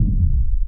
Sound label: tank stop int; Original TK Sounds